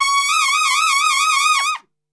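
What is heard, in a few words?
C# trumpet shake; Best Service; Brass Super Section; TRP SHAKE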